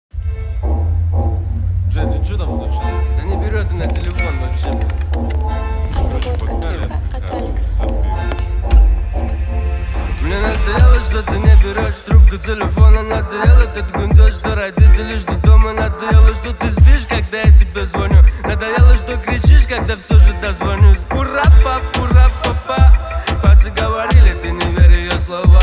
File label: Bass